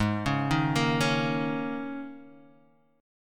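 Ab Suspended 2nd Suspended 4th chord, Guitar, Half Step Down tuning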